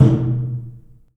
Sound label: DRUM; The Piano; InVision Interactive